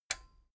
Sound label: metal button stereo release enable